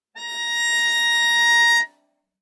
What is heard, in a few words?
Viola arco ff sul A Bb5 stereo; MIS Pitches - 2014; Strings